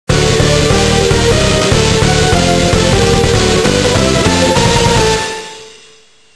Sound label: plasma victory; Gladiators